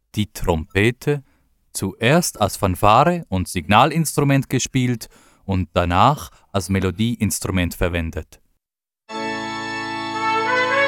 Trompete

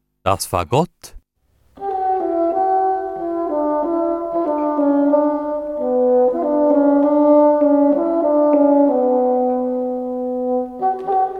Fagott

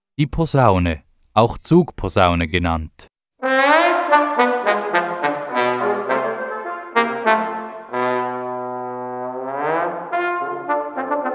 Posaune

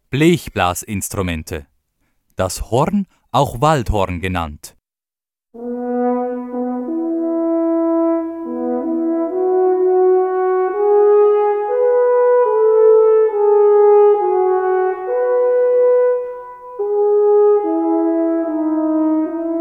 Horn

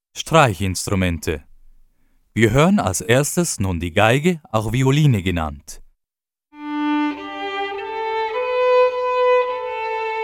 Geige

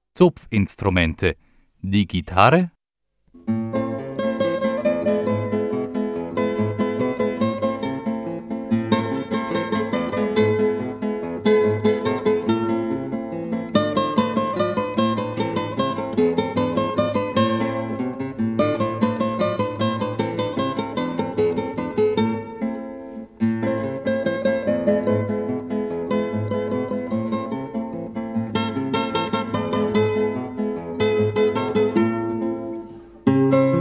Gitarre

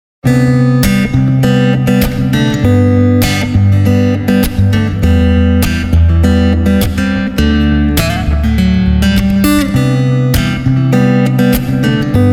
Western-Gitarre

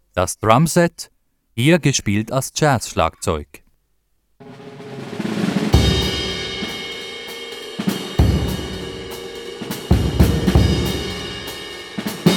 Drumset

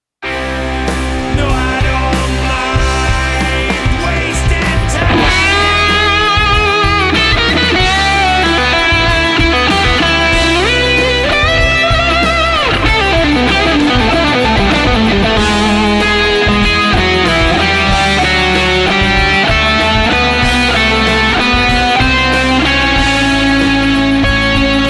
E-Gitarre

